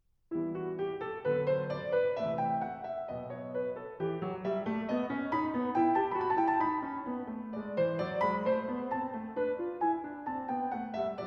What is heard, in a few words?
Air; Partita no. 6 in E minor, BWV 830